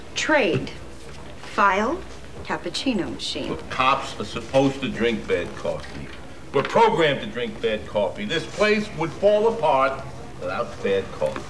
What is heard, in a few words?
bad coff; Seeing is Believing